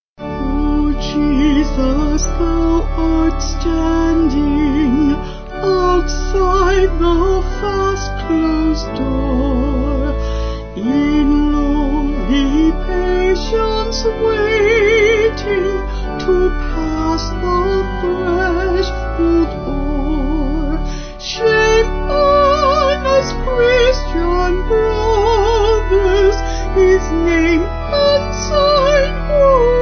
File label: Vocals Organ; Sung Lyrics; O Jesus Thou Art Standing; St Hilda